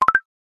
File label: notification; snapchat